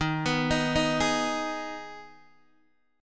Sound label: Eb Suspended 4th Sharp 5th Eb Guitar DADGAD